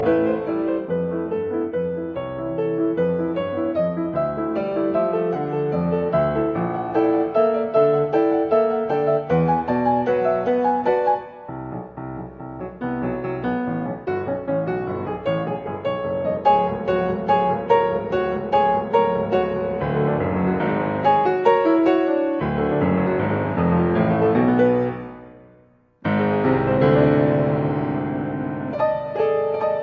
Piano Sonata

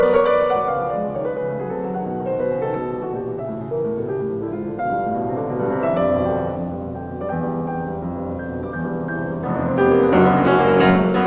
Piano Sonata in E major, Prestissimo; Piano Sonata No 30; Op 109

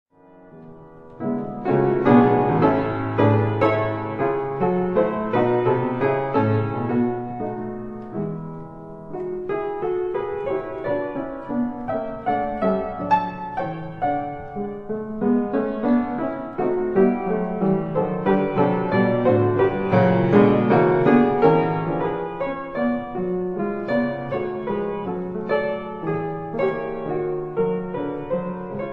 Etudes for piano, A major

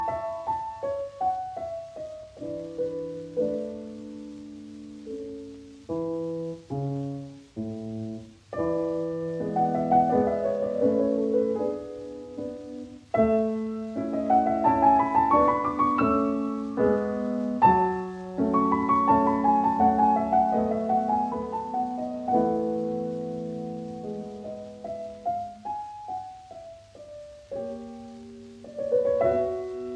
Piano Sonata No 18 in D major K 576 II Adagio; Claudio Arrau

